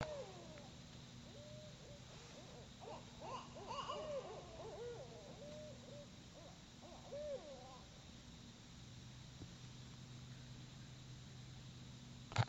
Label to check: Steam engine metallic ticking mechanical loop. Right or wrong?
Wrong